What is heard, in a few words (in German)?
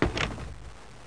Schirm; Loewe1